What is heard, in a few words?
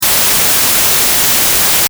Noise